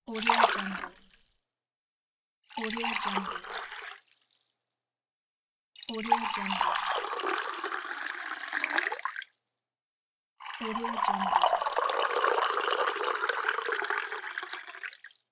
the pouring water

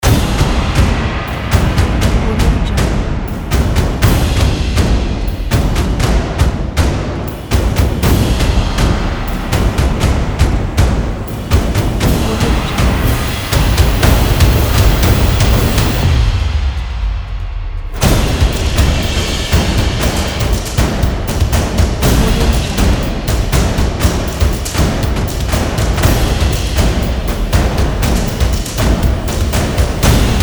action drums

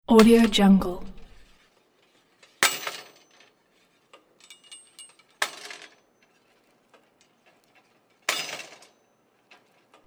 leg machine reps; sound ideas

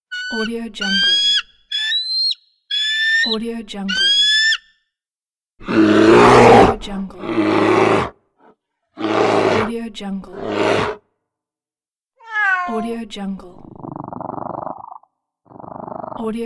animal voices pack

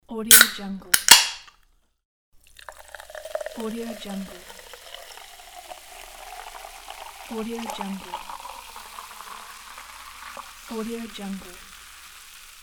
pouring beer

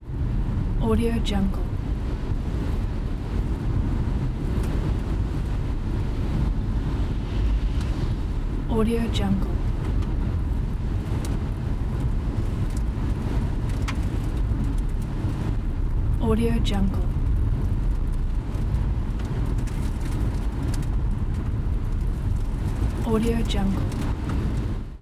strong wind; soundsground

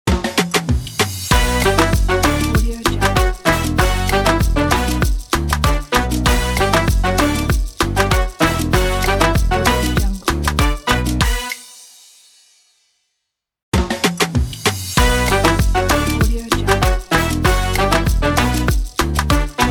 happy summer latin logo

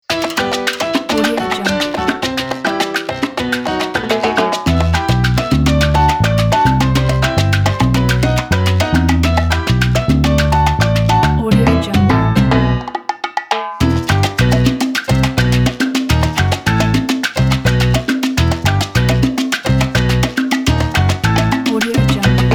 wild latin salsa

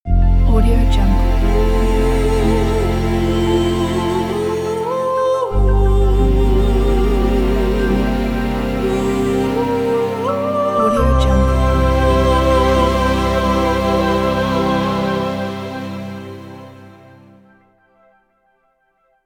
magic wish logo; cinemonia

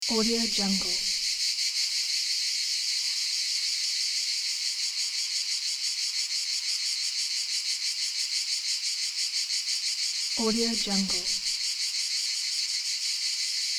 cicadas loop; federico-manuti

